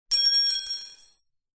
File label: industrial wrench spanner metal small drop garage floor; zapsplat